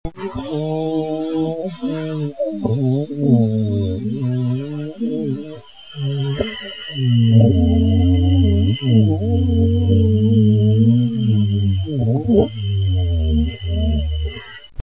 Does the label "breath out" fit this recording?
Yes